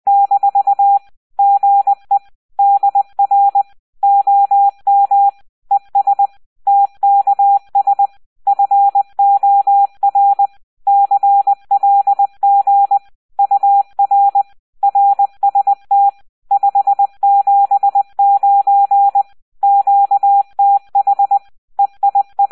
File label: cw